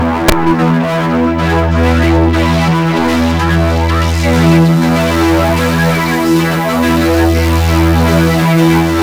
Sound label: MORPHUZZ; Spectrasonic Distorted Reality 1; MORPHUZZ BS